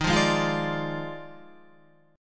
D sharp Major 7th Suspended 2nd Suspended 4th, down, Guitar; strummed; JGuitar